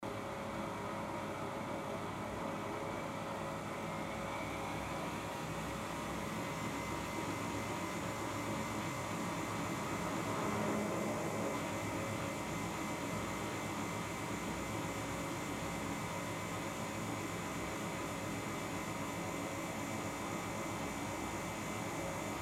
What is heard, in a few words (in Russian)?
zvuk stiralnoj mashiny